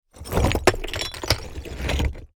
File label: Asphalt concrete block dragged on a pile of rocks